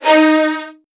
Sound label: D#1, VN 16TH, Virtuoso Strings; ILIO; Kirk Hunter